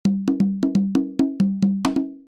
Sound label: CONGAS; multitracks